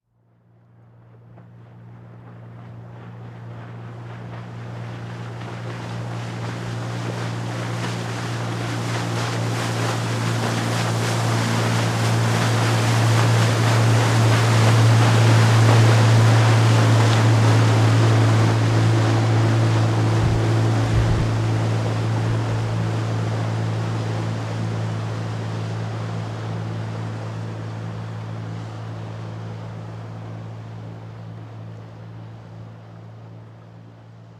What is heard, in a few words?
Vehicle Jet Ski Pass By Slow Distant; Boats (Sound Effects:Vehicles)